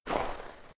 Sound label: snow, footsteps